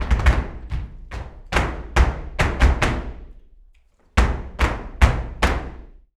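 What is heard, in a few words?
percussion, ALEGRIAS TAP, Flamenco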